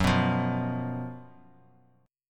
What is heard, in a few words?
F sharp Major 7th Suspended 4th F sharp; x,9,9,10; strummed